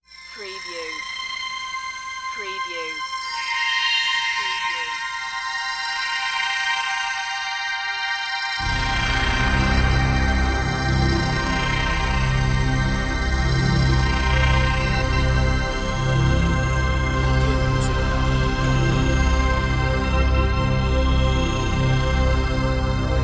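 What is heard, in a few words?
Production Element Bed Synth; Sound Effects Bible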